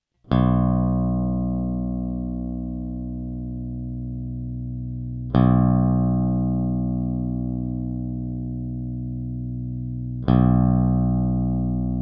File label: drop b; Drop tuning your guitar is simply where you tune the bottom string